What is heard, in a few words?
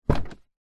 suv door open gentle internal nissan patrol